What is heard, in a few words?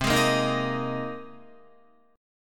C Minor 7th Sharp 5th C Guitar; Cm7#5 strummed; JGuitar